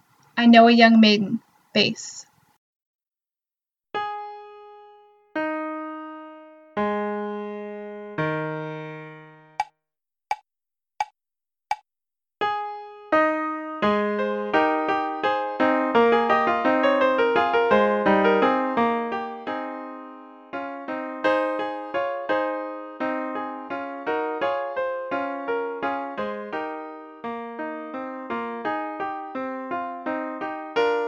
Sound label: full bass young maiden